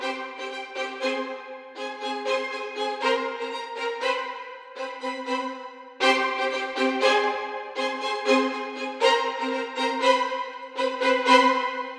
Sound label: violin; скрипка